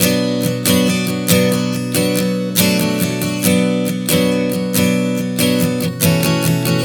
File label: Strum 070 Bm, One Chord Strumming 070 bpm; Sonic Foundry (Sony Creative Software); Songwriter Acoustic Guitar Companion